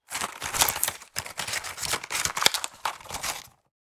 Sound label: Pharmacy box shuffling medicine packages